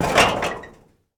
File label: IMPACT Metal Sheet Subtle; mono; IMPACTS; Universal Sound FX